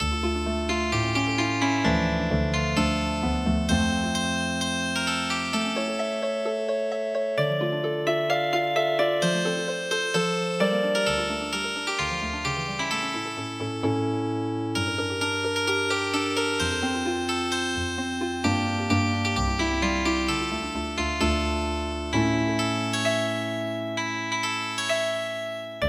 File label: Healing Harp, midi traditional